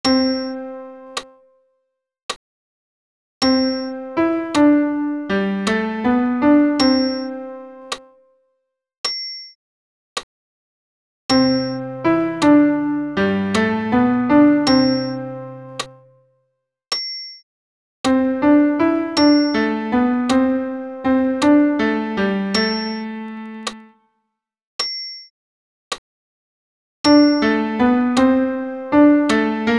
Sanctus ten pian acc

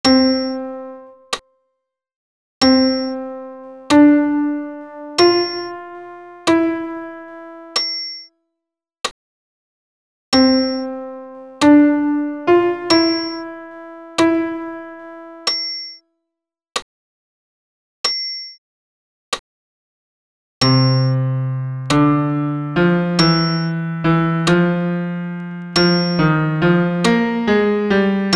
Crucifixus ten pian seul

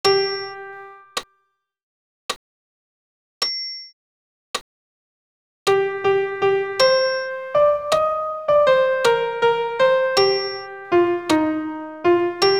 Credo sop pian seul

